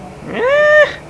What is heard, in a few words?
"ehhh"; People; Sound Library 2000; Wayzata Technology